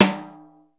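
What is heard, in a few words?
JAZZ snare; JAZZ KITS; Crypton; DrumBase5000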